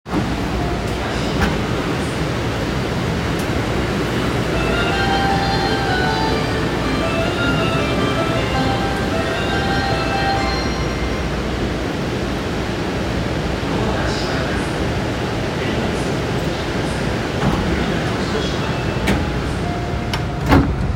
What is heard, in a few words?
train sounds; 有楽町線東池袋西